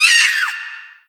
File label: banshee attack; Crypt of the NecroDancer